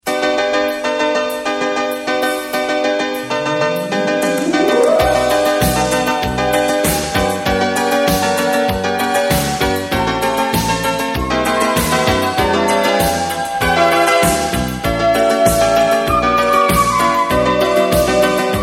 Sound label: instrumental